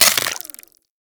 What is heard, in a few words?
Inf Uzi Mech; Infested; Weapons